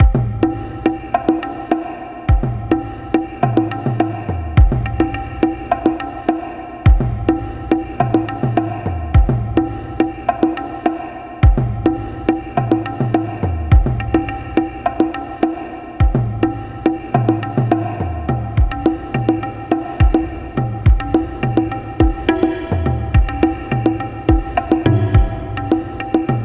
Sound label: Smog Drums